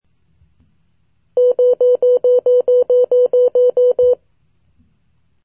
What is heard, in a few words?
burst